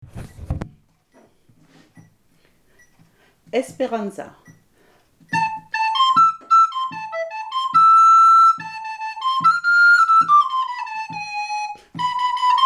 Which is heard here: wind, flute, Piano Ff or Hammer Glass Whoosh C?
flute